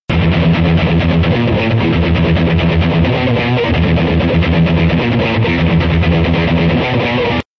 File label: dark; fast and heavy-this could be funny if tuned down and slowed a bit...; guitar